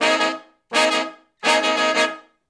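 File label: Funk Riff (Ab) harmony, Horncraft for R&B; Sections; Crimson Blue and Fabulous Horncraft 4 RnB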